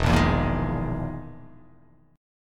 E Major 7th Flat 5th down

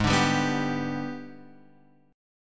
Ab Minor Major 7th Flat 5th, down, Guitar, Open F; AbmM7b5; strummed

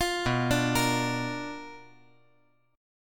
B Minor Major 7th Flat 5th B, chord

